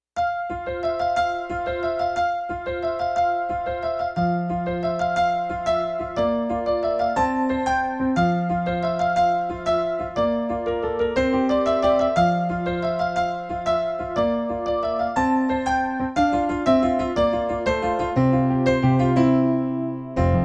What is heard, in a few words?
piano solos; departure songbook